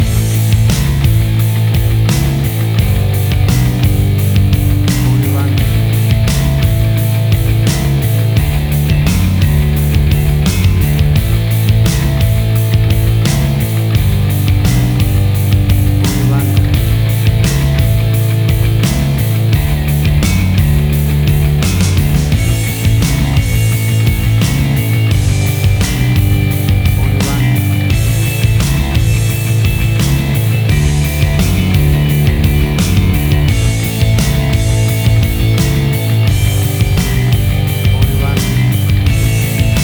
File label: Big Stadium Classic Rock Mix